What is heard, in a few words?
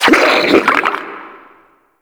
Grineer Frogman Pain, Vocals